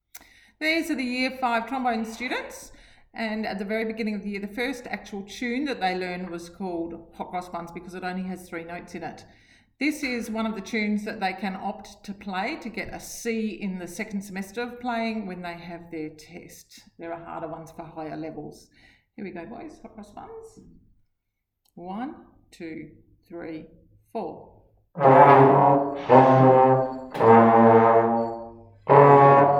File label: Trombone Hot Cross Buns; Year 5